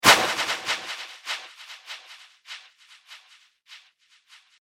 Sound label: Air Whoosh With Some Delay; whoosh sound effect; swish, sweep and swoop